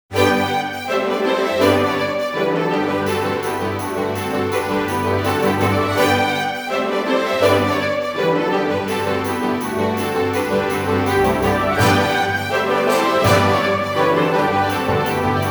Russian Dance “Trepak”; Taiko no Tatsujin Doka to Oomori Nanadaime 2006; PS2

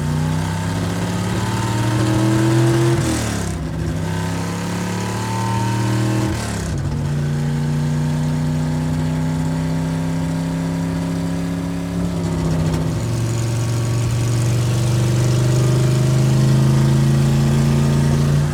vehicle musclecar drive

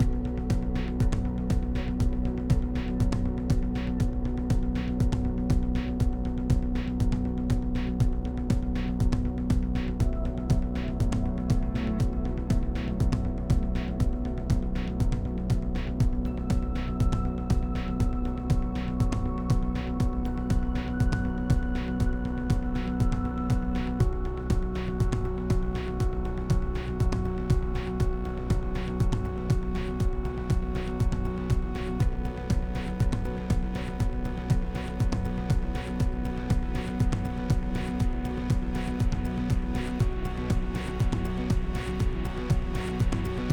Dash 8CH 120BPM; Anchor Act1

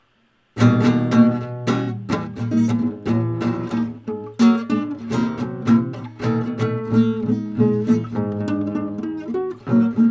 guitar; Bad Elvis; stick it to the man

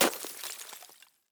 ice break; teardown; ps5 windows xbox series xs

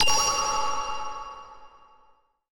Confirm

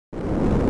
fast skydiving intro; fast skydiving part 1

